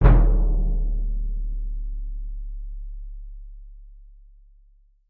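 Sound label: music drum hit; The Curse of Nordic Cove; MusLevel1 DrumHit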